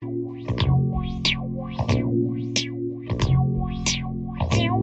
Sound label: Yacht Ringtone